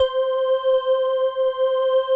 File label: HOLLOW ORG; Greytsounds; Scott Peers Sound Engineering